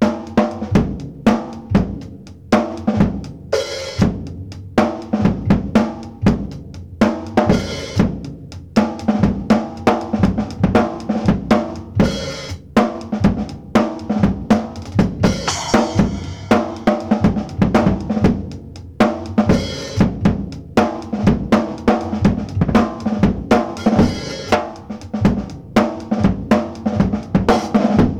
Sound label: beat complex funk loop 120, acoustic